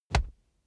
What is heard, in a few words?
combat cloth hit; krieg-waffen; nahkampf; soundpool